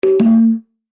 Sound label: Chime Percussion; Multimedia